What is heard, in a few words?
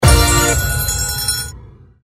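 party girl free games trigger; Party Girl Ways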